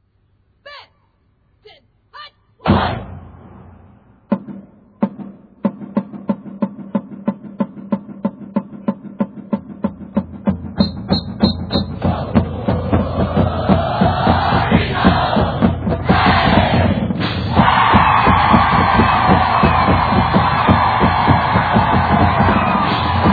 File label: Pregame Fanfare; Auburn; War Eagle